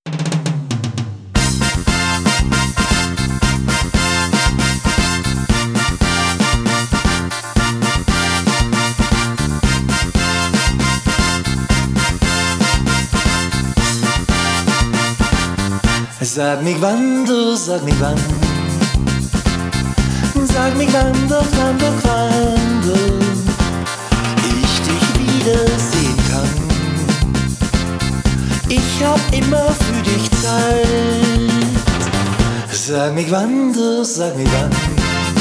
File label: Quando Solo